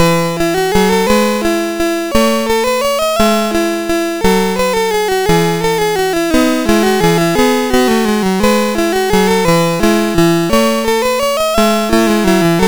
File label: Menuett, sid MOS6581R4; Music Shop